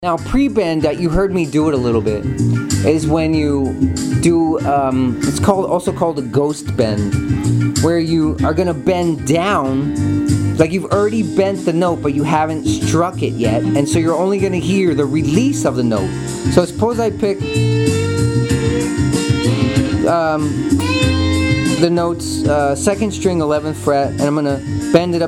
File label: pre bends; expressive elements